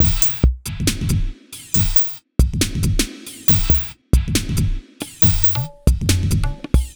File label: Bpm138 Endbongo Break, Dubstep, Drum Loops